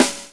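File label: snare drum funk; E-Lab; X Static Goldmine 2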